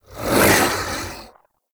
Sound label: Archon Whip Ranged Attack Projectile; Enemies; Weapons; Warframe; The New War; Nira